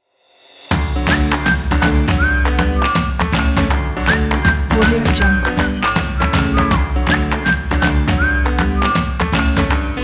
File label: the funny frog